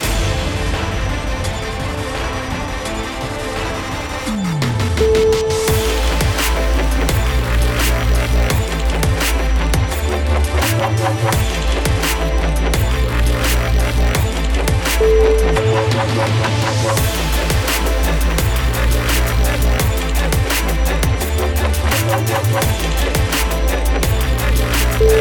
analogue dubstep

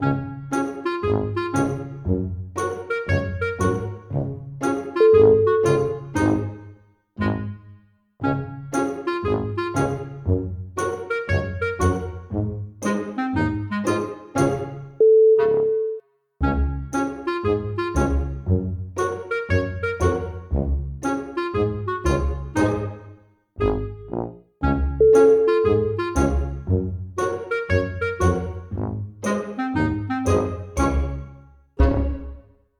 comical clarinet

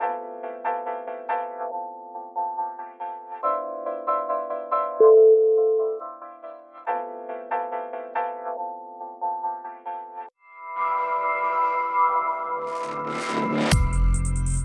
electro pulse

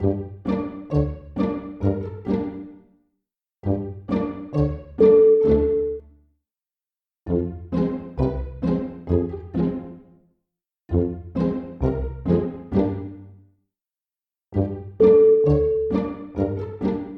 funny pizzicato

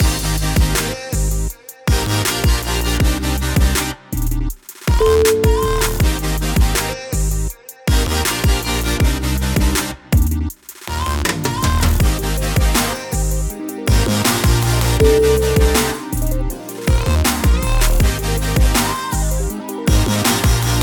sounds of future

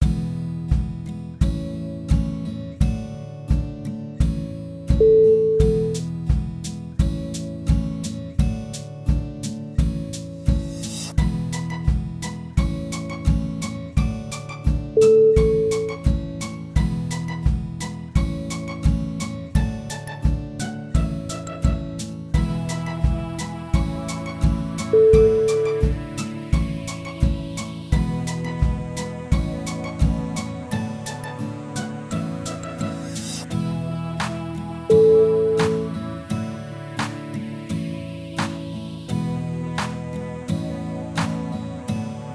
a positive working vibe

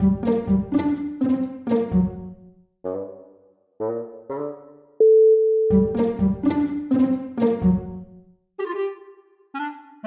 sneaky mouse

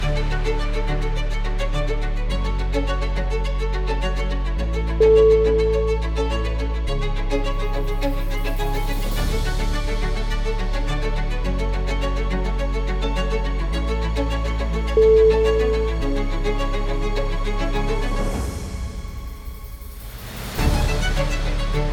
classical sequence

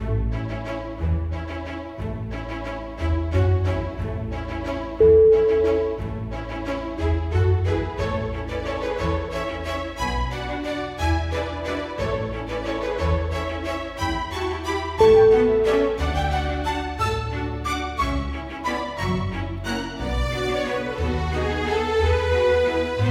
winter waltz

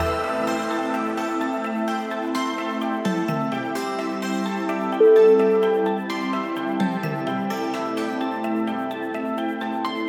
world documentary

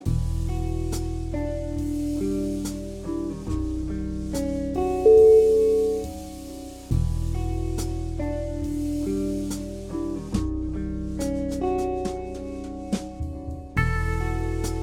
deep trip hop

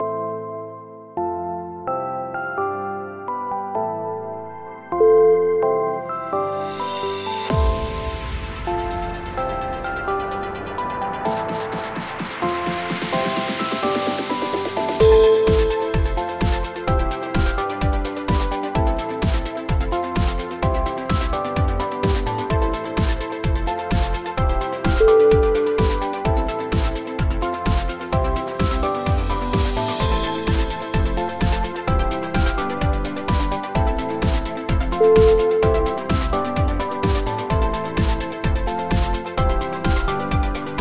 house disco party

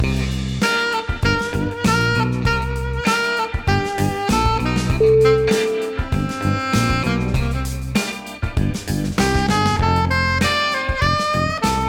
funky

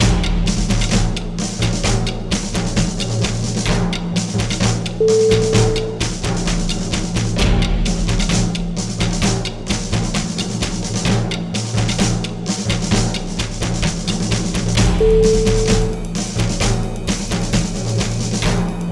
marching drums

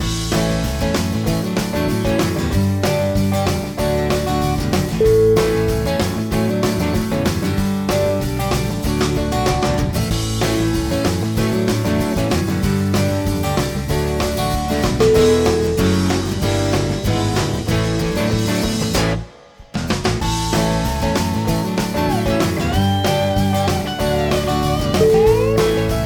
uplifting southern country

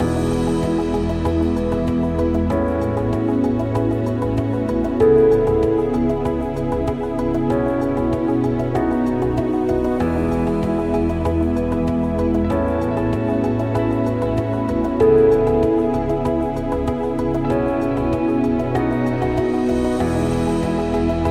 inspiring mind

